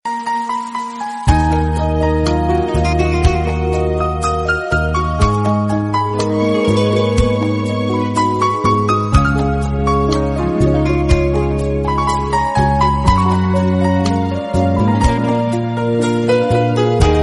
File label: romantic piano